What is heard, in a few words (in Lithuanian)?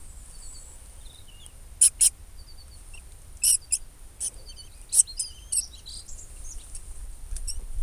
Namine ziurke